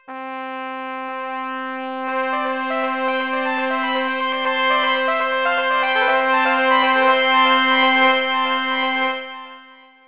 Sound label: Battle